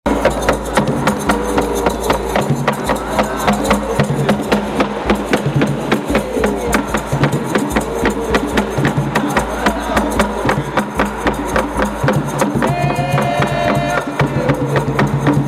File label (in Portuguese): batuque de umbigada, Piracicaba; malfeitor